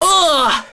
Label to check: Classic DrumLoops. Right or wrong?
Wrong